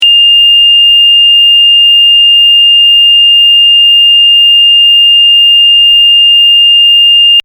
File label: medium tone; Transducers USA